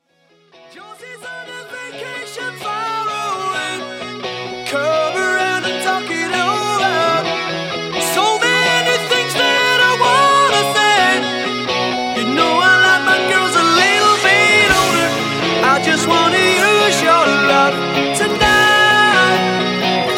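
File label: Acapella intro Mashup 129 Bpm Clean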